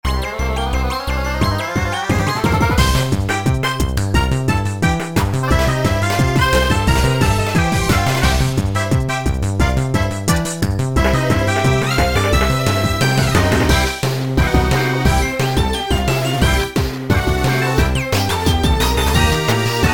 battle rival; PokeRogue

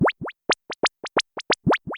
Metronome; battle anims; pokerogue

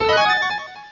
Roost; battle anims; PokeRogue

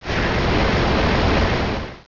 Waterfall; PokeRogue; battle anims

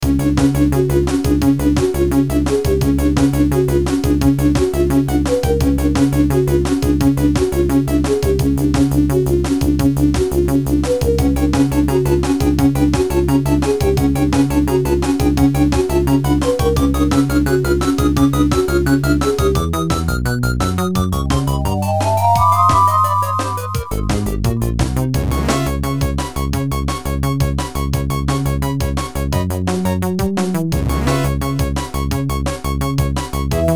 power plant; PokeRogue

